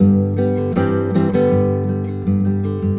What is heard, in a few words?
SPANISH G, GUIT, 80BPM; Best Service; World Colours